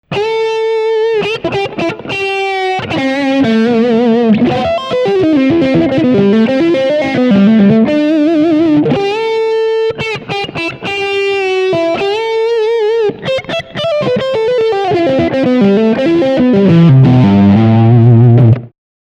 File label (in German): Lick beide Pickups